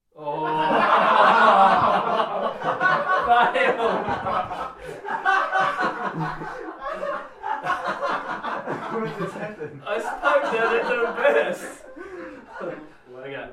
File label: balloon bursting fail